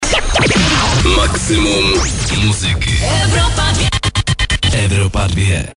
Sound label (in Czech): jingl